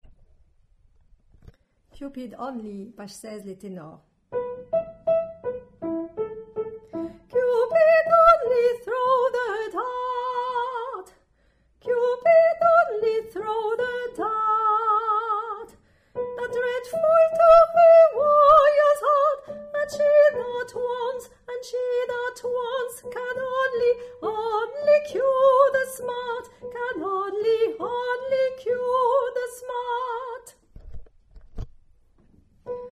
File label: tenor